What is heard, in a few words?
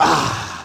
"ah" exhale